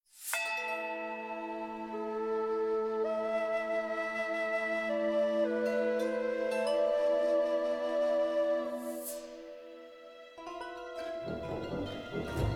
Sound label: Music Stem